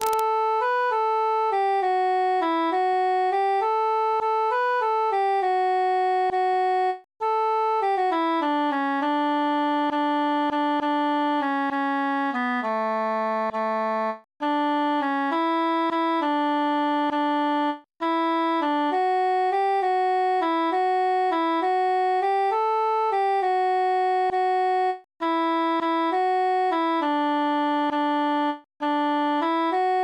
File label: transposed cantus solo